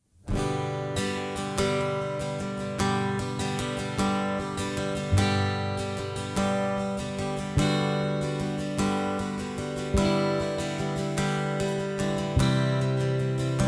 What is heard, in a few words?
Accompaniment